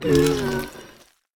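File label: Homunculus Shivering damage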